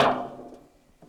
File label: bleacher step; Golden Crayon Sounds